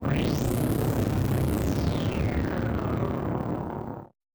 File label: Malfo Syn, malfunctioning synth